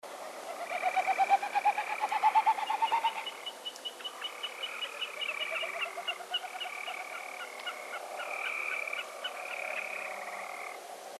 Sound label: SNIPE COMMON; species/birds; wbba